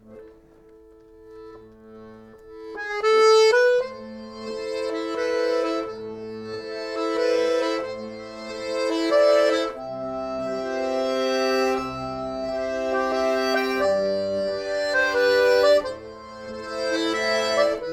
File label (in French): tour accompagnement, accordeon